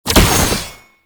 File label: Fist Claw Spike Despawn; Weapons; Warframe; Tenno; Incarnon